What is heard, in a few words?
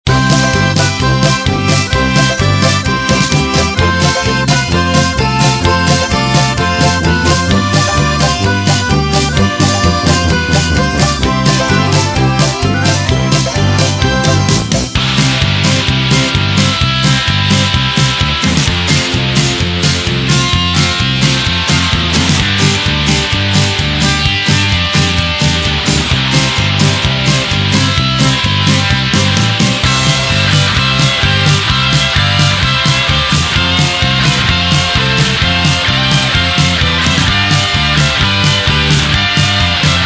Polka